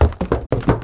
Buillding

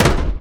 Shotgun

